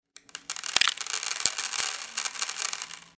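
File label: sound of scattering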